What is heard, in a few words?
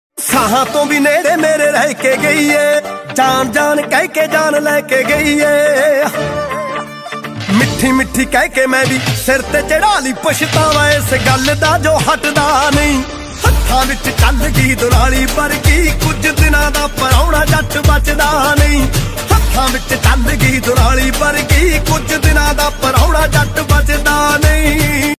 Dance Punjabi Ringtone